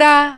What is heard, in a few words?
Vocal Oneshots; Vengeance Samples; Vocals